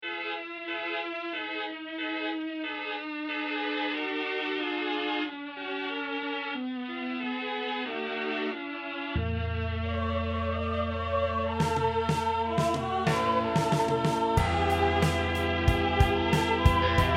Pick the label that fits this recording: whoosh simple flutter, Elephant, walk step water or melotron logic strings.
melotron logic strings